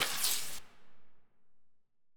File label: Arrow; FMOD; Arrow Wall; Enviroment